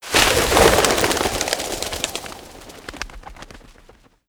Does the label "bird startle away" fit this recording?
Yes